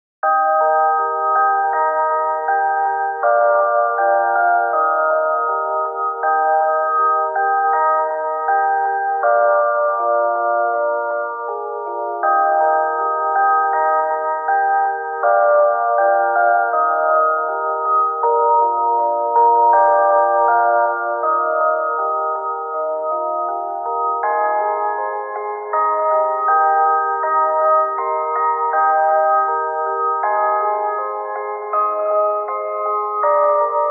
chime Loop

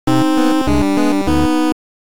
8bit

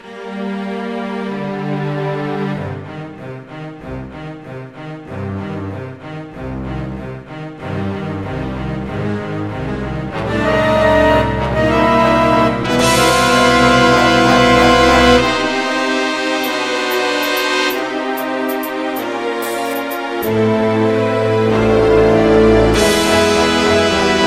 battle; 3kaisen

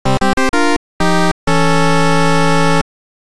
8bit

